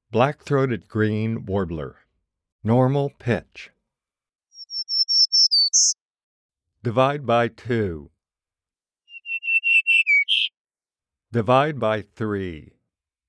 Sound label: Black throated Green Warbler